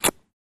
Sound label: Cloth Rip Quick